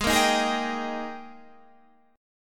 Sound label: Ab Major 7th Suspended 2nd Ab down Guitar Standard; strummed; JGuitar